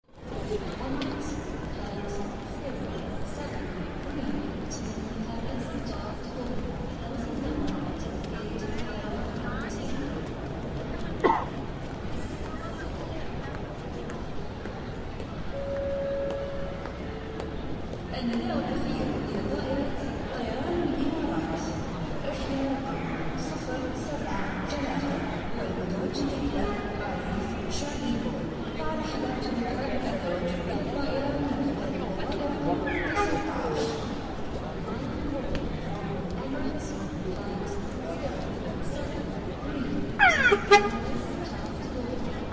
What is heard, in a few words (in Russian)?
Terminal ajeroporta